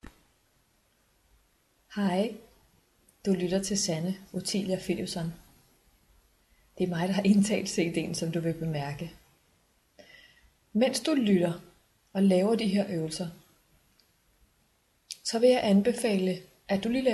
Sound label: Intro MEDITATION